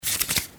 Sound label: page flip